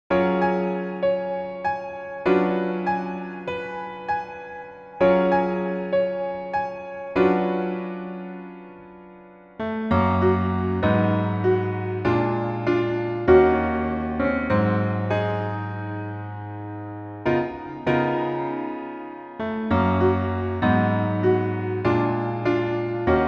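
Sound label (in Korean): Piano version 멜로디MR -1키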